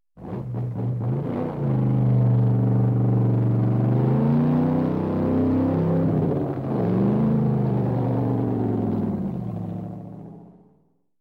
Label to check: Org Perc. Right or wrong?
Wrong